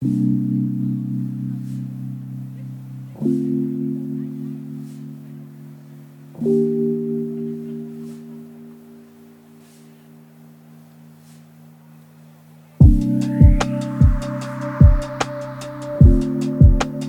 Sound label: lofi hip hop